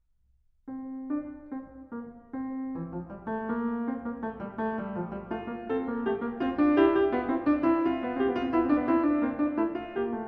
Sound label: Well-Tempered Clavier, Fugue No. 11 in F major; Book 1; BWV 856; Bach